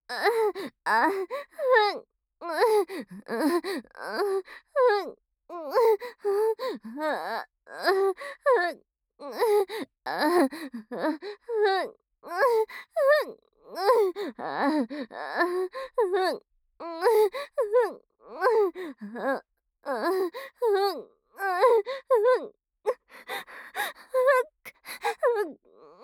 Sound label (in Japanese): 激しいピストン喘ぎ, ASMR; アドリブ集